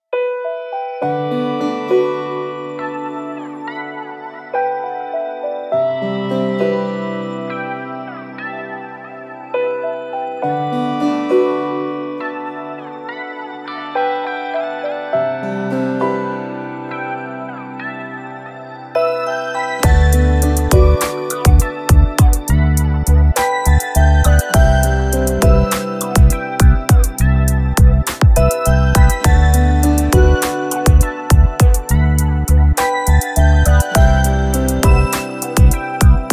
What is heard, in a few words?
bgm